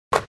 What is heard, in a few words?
Basketball net swish